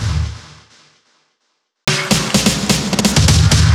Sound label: Fills 128BPM, Clubsounds